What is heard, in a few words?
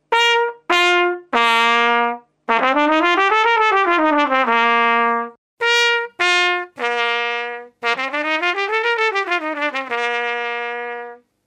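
trumpet mute wood bottom; faxx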